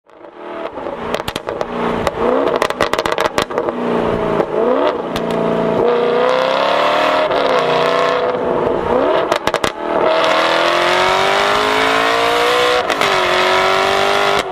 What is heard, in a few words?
Alfa Romeo 8C Engine; The Symphony of the Engine